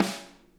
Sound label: SNARE, Interactive Drum Kits; Sonic Reality